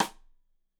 Side Stick Stereo; Deeply Sampled Drums; SMDrum

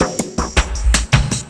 LOOP SD, 160 BPM, Drum & Bass; Ueberschall; Drum & Bass Flash Trackz

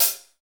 CYM 14, Real HiHats, 14 Hi Hat

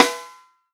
snare drum, FUNK SNARE CHROMATIC; Best Service ProSamples vol.43; REAL DRUMS

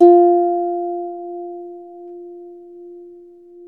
Troubador Harp

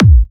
Trance Kicks, Bassdrums

